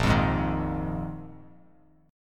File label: A sharp 7th Suspended 4th down; strummed; JGuitar